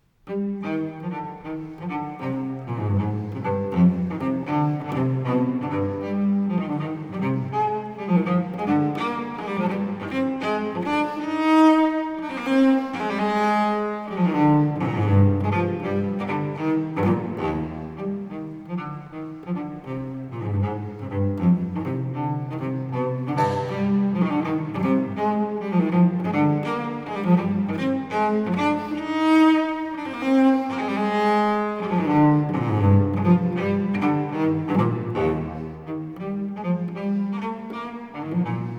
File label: bach suite nr 5 in c gigue